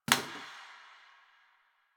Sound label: Janitor Plastic Spray Bottle